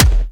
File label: Weapon Punch Rifle